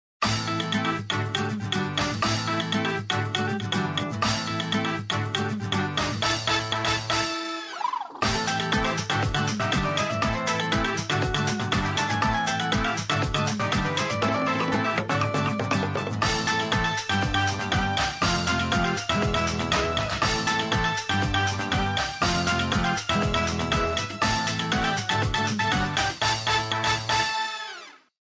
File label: Latin Fever; LG CX231